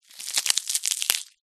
horror gore body part break snap

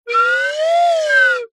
cartoon slide whistle double pitch up down

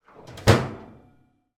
household oven grill door close

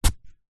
metal shovel spade single dig grass soil; industrial; zapsplat

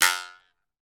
comedy twang; sound effects one

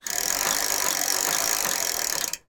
transport bicycle back pedal

